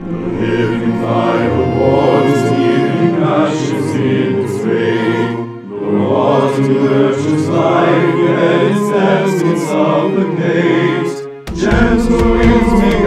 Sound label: Chorus; D&D Music